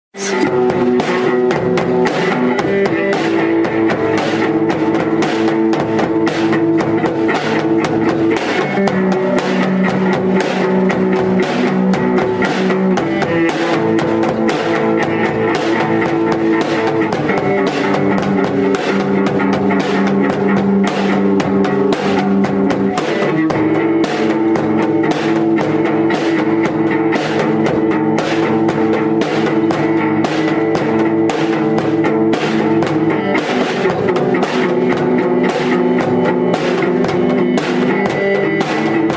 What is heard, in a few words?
instrumental